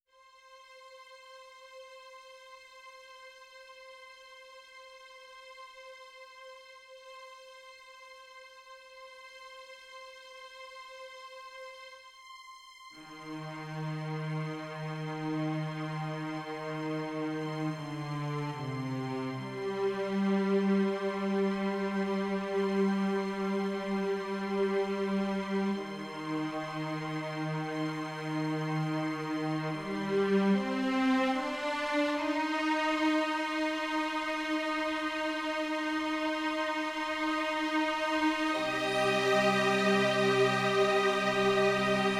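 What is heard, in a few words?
strings version; By Burak Sound Bank; dramatic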